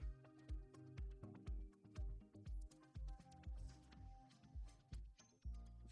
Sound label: audio diffusion test